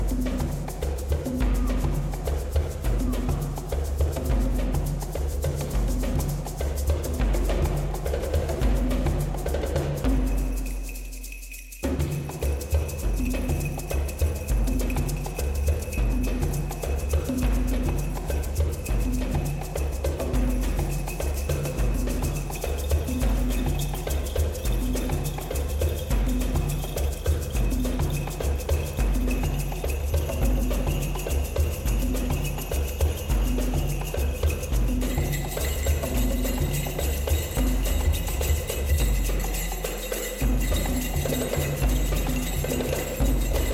CIRCLE PERCUSSION, heavy percussion and large japanese drums; world of heavy percussion